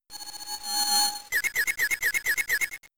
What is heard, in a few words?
Supersonic; Pokemon; Gen 3; attack moves; RSE